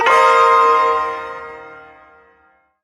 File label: Shawzin Blues Chord; Warframe; Duviri Ersatz Shawzin; Chords